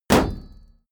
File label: sword hit shield